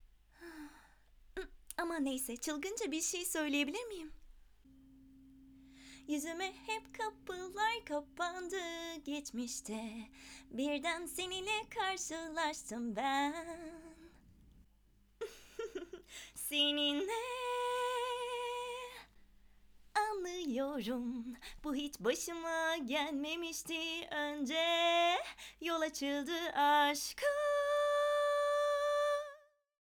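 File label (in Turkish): şarkı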